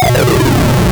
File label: PLUMMET SND; Keen 2